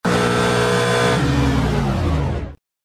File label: buzzed off; agt